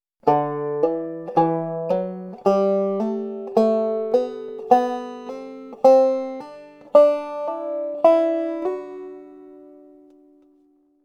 gegriffene hammer ons

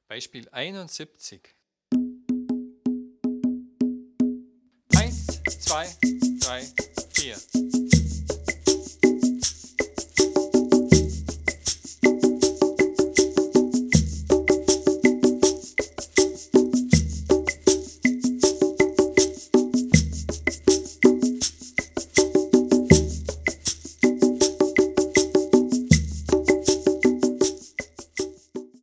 viererrhythmus

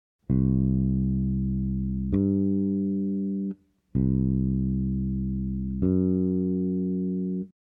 reine verminderte Quarte

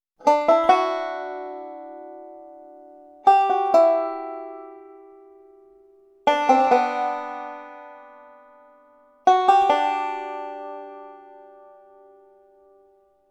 Triolen rolls Varianten

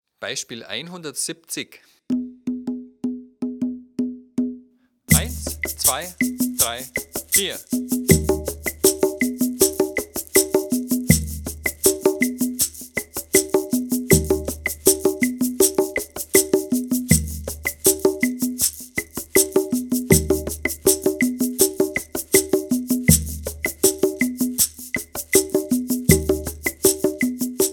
viererrhythmus

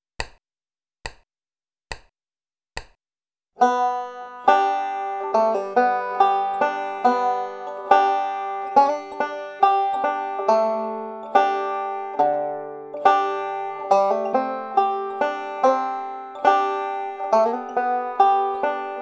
mit hammer on pull off und slide; skip to my lou

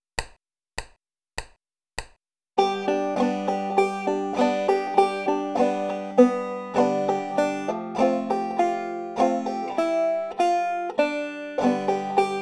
hammer on pull off und slide; june apple

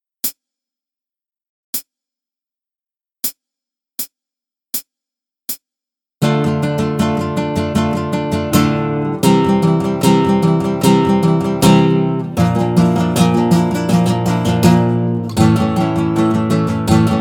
akkordbeispiel

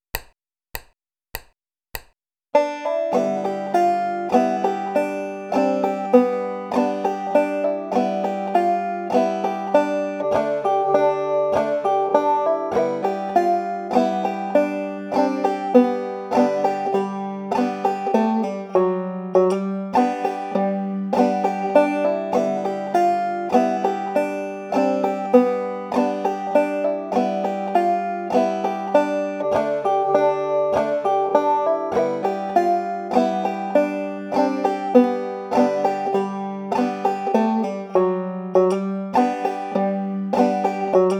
old joe clark mit hammer on pull off und slide

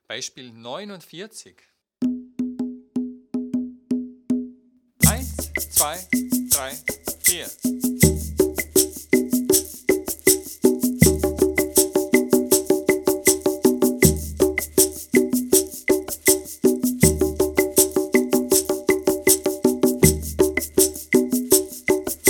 viererrhythmus

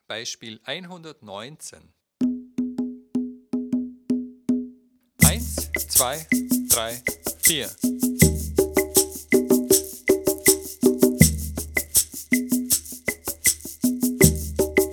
viererrhythmus

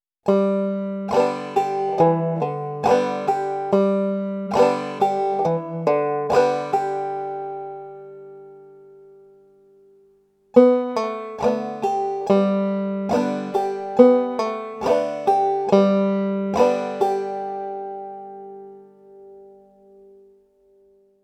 bum ditty mit pull off

